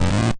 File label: stego Moan; Dino Run